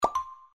pop close

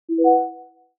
upgrader fail

